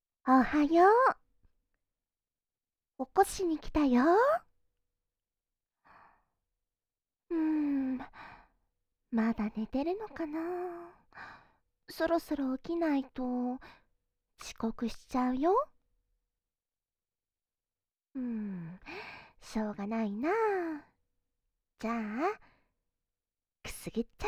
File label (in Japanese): お隣のお姉さんがひたすらくすぐられる音声